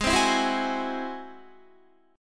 Ab Augmented 7th Ab down Guitar; strummed; Ab+7; JGuitar

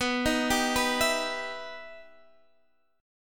B Major Flat 6th

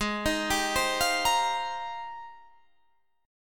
G sharp Major 13th, Guitar, Standard; 16,18,17,17,18,18; JGuitar

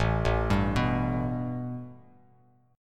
A 7th Suspended 2nd Bass Standard; A7sus2 arpeggiated; JGuitar

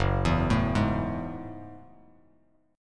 Gb Minor 9th, Bass Standard; arpeggiated; JGuitar

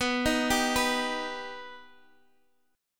B Augmented B, guitar

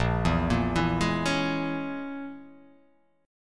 A sharp Minor 7th Flat 5th, down; arpeggiated; JGuitar

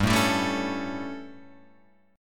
G Suspended 2nd Flat 5th G Guitar Open F; Gsus2b5 strummed; JGuitar

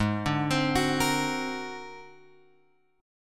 G sharp 9th Flat 5th G sharp; G#9b5 Chord {4 5 X 5 7 6} arpeggiated

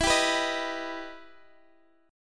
E Minor Major 7th, Guitar, Half Step Down

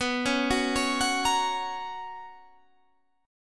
B Major 7th Suspended 2nd, chord

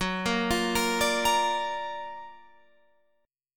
Gb Augmented Major 7th Gb down Guitar Open F; Gb+M7 arpeggiated; JGuitar

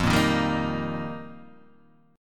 F 7th Suspended 2nd Suspended 4th F Guitar Open D

